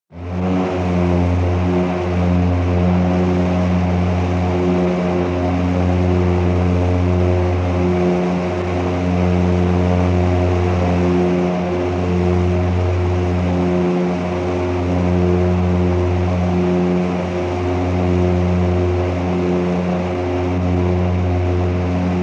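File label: Prop B25 Interior Steady Flight, ww2 bombers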